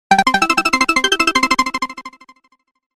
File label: happy, Klingeltoene